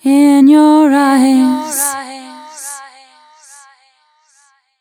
f 140 mel b-flat, fem Vocals

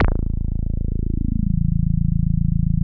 TB 303 BASS; BASS SOUNDS

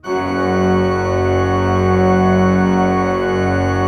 F1, cathedral organ; Propeller Island

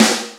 SNR ROCKE, snares; Roland L-CD701; Sn Modules 7

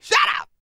MALE HUMAN, R&B & Dance; ILIO; Vocal Planet VOL-4

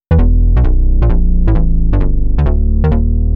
Bass 132 E, uk garage

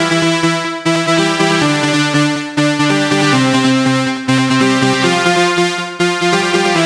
NRG Arp, trance

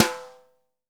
sonor snare

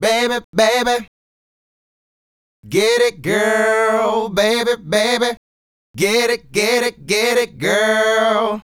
Male Group, Hip-Hop and RnB Vocals

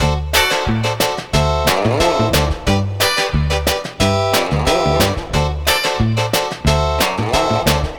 Lounge Full Mix-D; Zero-G Kocktail Kollection; Construction Kitz (Lounge); Welk Lounge 090

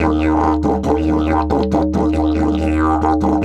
140, DIDGE RIFFS; Big Fish Audio - Didgeridoo and Other Primitive Instruments

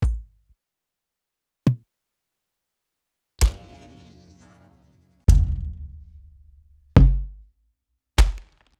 Kicks

